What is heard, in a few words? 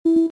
gamecube